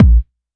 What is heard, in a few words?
TR909 Kick, Roland SH-32 Drumset